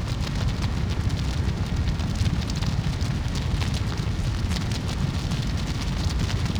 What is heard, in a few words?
lava pit; Halfpipe